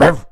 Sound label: bark